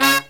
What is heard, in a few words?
LOW HIT, Brass